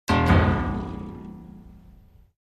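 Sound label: Grand Piano Strings; keyboards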